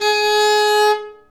nyckelharpa G#4; SoundBytes - Early Patches

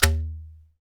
PRC TUBE, Perc Toys; Spectrasonics; Supreme Beats; World Dance

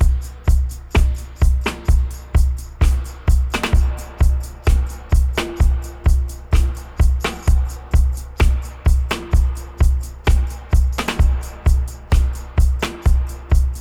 128 DUB, DRUM LOOPS 128 BPM; Drumdrops In Dub VOL-1

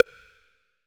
Rim; Zero-G - Future Wave; Drums; Xtra

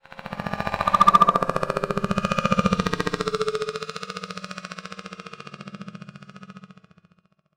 Dark Demon; Sonic Foundry (Sony Creative Software) - The Electronic Music Manuscript Collection

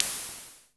PER, TECHNO PERCS; Crypton - DrumBase5000

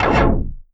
VOXHIT, Anime Sound FX; AKAI Anime Sound FX

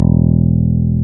BASSES, Roland

